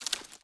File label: twigs; The Sum of All Fears